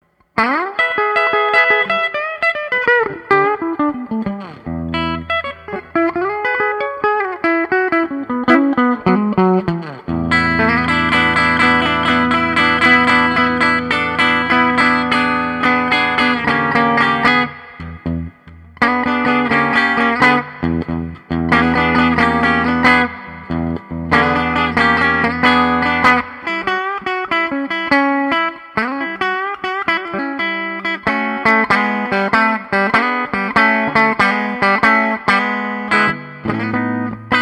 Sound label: strato bridge